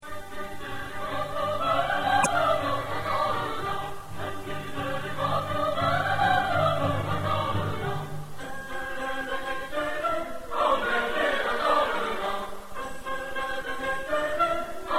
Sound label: Chamber Choir; Istanbul Chamber Choir